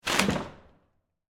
Paper Towel Tear, Office, Paper, Towel, Dispenser, Tear, Sheet, Public, Bathroom; BlastWave